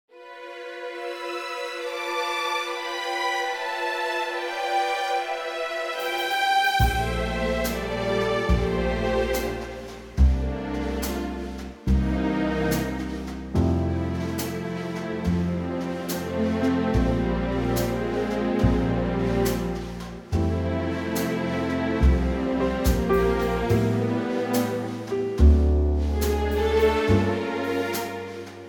orchestra F

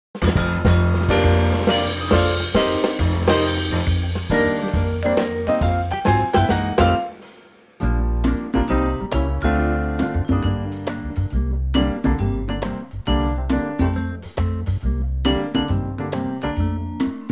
Bb to B, male

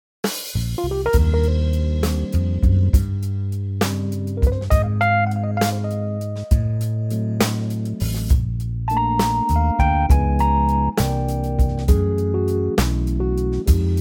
Eb smooth

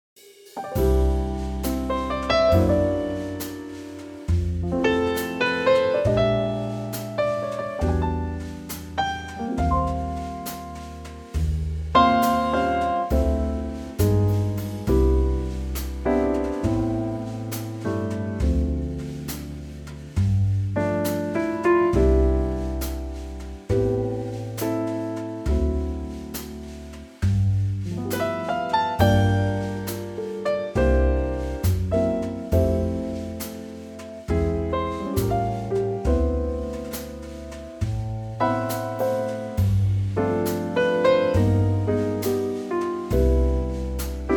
C Trio

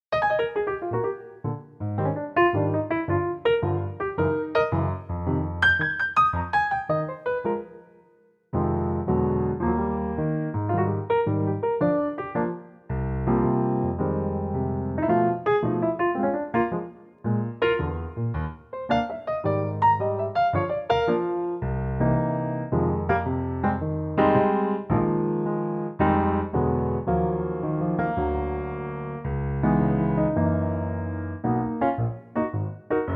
Eb Piano

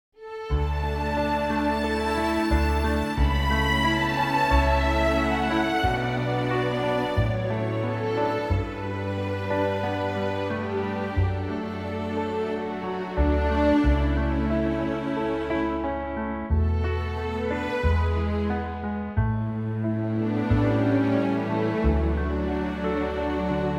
D orchestral

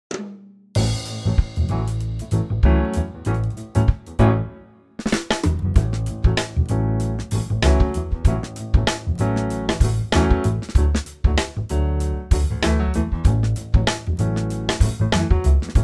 A Trio

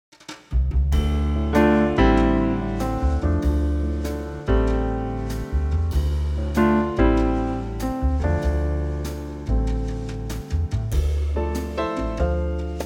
Dm Trio swing

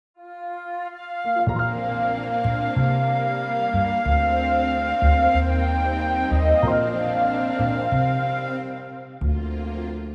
orchestra

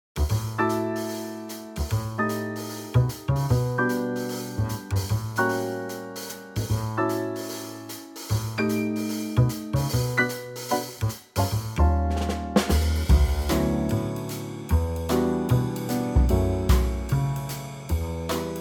D Trio

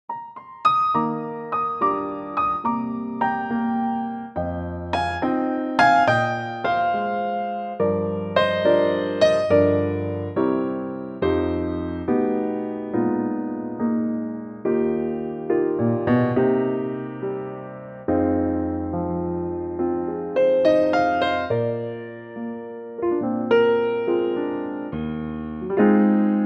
Eb piano

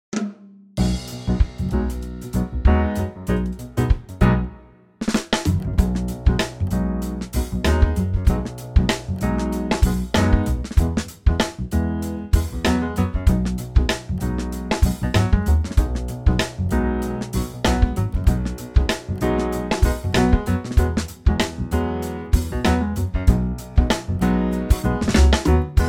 B Trio

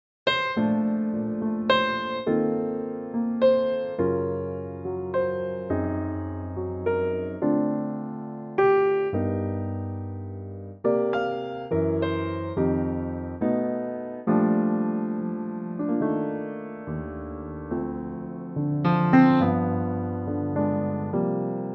Bb piano; Real Book

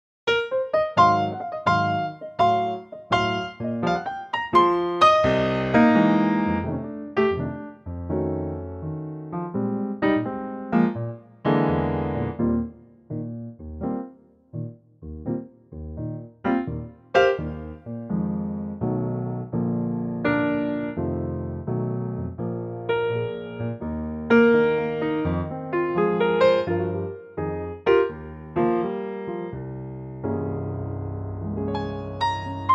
Eb piano

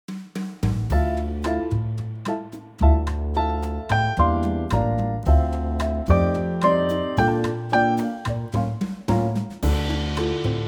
Dm Trio

